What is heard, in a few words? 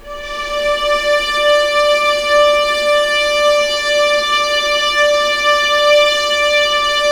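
D3 legato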